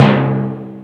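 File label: timpani; cubase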